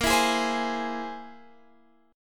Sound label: C Augmented 7th A sharp